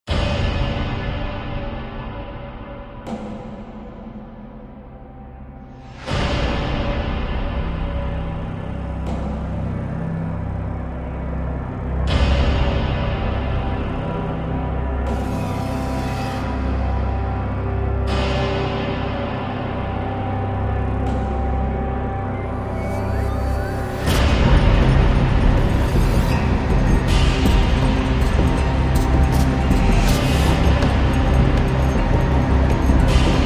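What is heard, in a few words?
Paranormal, Dark horror background music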